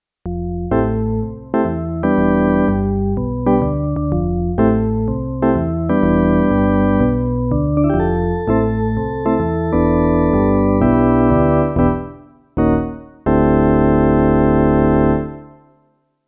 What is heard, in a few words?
Drawbar Organ; Roland E-X10